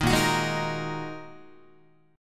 A sharp 7th Suspended 4th Sharp 5th A sharp, Guitar; strummed; chordshape; 6,6,9,6,7,9